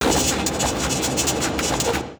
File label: alien signal; Stellaris